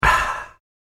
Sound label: Ah Satisfied Drink Sound; Drinking Sound Effects; human sound effect